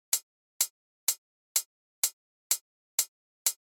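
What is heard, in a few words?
Hi-hat Close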